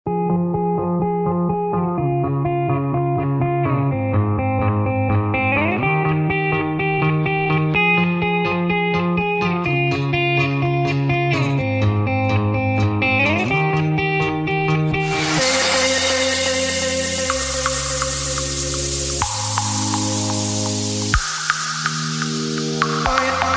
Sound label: mix ringtone